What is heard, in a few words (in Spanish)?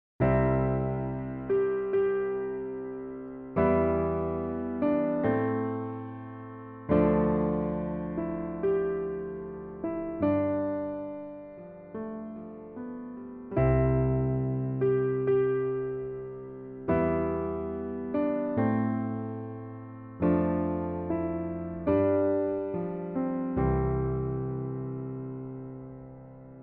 nuevo mundo piano; funerales